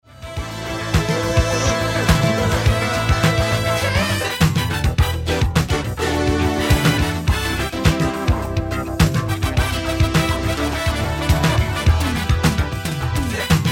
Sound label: karaoke sample